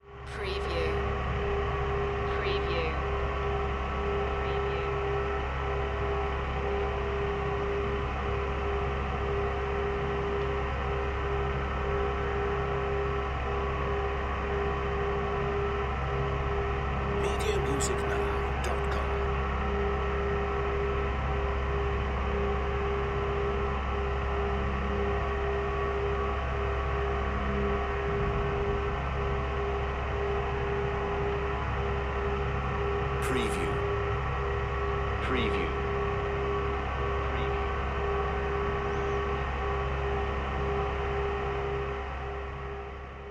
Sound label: Factory Hum; Sound Effects Bible